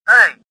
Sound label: confuse; worker1